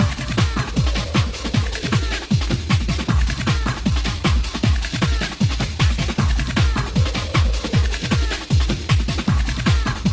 tango

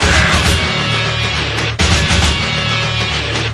riff D minor

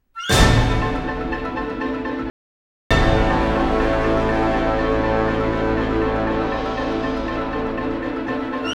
orchestra

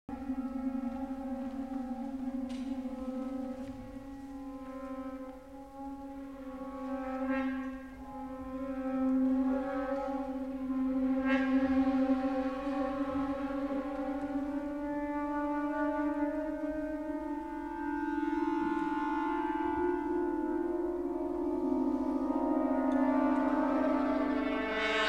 SPACE ODYSSEY ATMOSHPERE; SPACE ODYSSEY OST

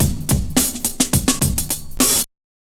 beat

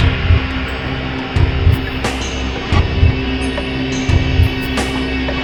DOWN SAMPLE C MINOR

